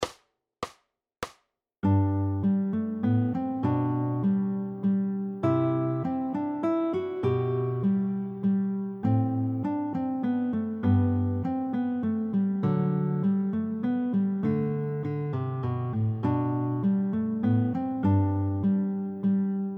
Menuet v G; ZABRNKEJTE SI ... HITY KLASIKY

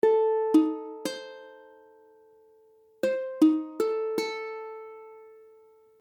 Adim, akordy ukulele

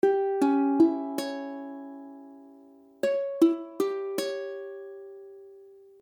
Cisdim, akordy ukulele; C#dim; C# akordy (=Db akordy)

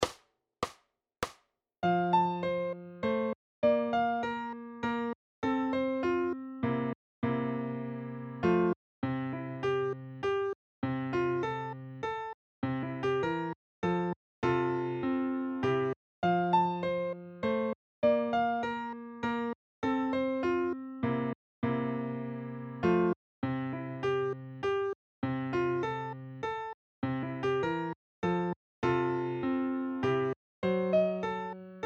Menuet v F

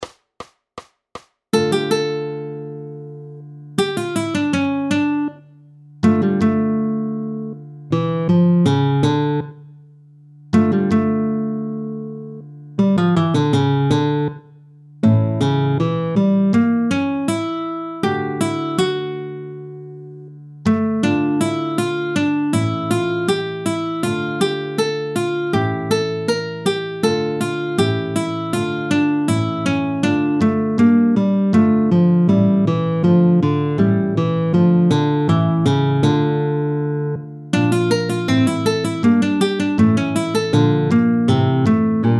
Toccata a fuga D moll, Kytara; Klasika; Super Snadná Kytara: Klasika pro samouky a začátečníky

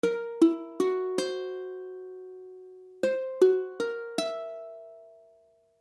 Fis7b5, akordy ukulele; F#7b5; F# akordy (=Gb akordy)

